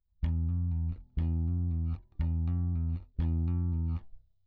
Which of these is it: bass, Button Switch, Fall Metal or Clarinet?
bass